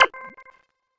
rythm

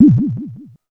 Down; SE Ing Other Rule#25 (SE Sun 00 Down)

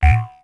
Sfx Icon

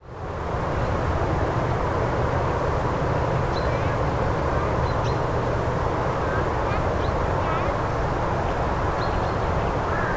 Cities rome Park

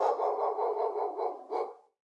Ambience Menu Dog Barking

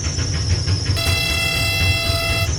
Cruiser Push Pedal In Park; lethal company

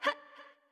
Fireball; Winkubus

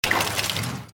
metal block break; SFX Environment; The Binding of Isaac

